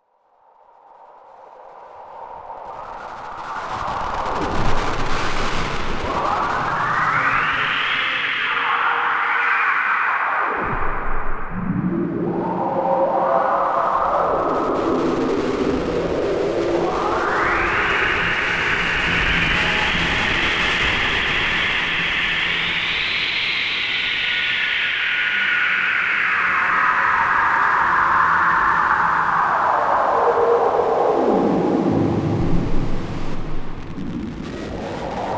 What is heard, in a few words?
downsampled fuzzed resonated